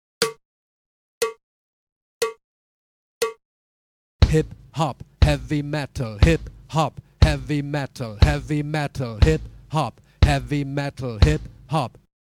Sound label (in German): Uebung, schlagzeug